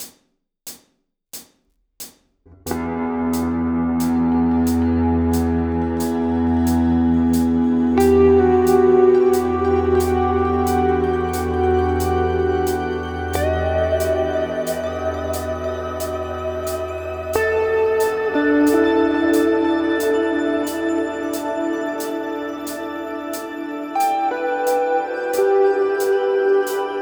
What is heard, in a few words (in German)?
Coole Rhythmen mit Delay 90 BPM Freely; Guitar Rig 7